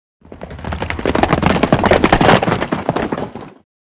Horses Stop Group Hor

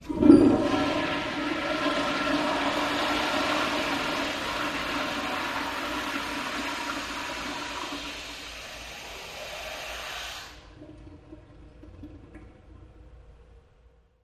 Toilet Flush Public

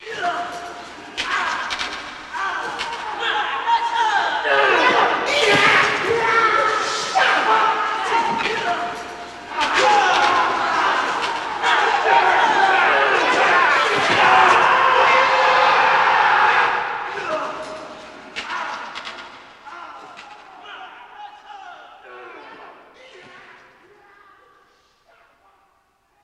Fight Walla Action, boxing

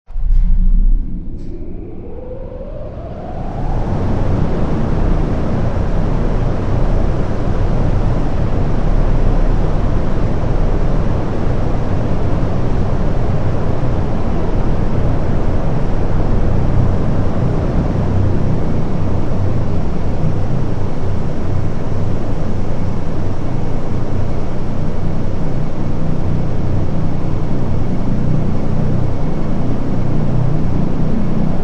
Power Up Large Wind T, factory

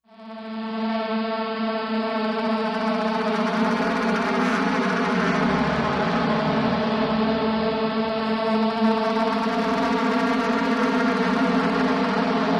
Hi Buzzing Amb Swarming, buzz

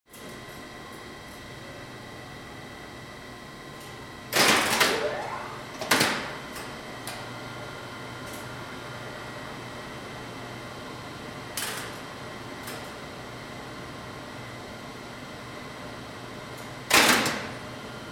Elevator Shaft Motor

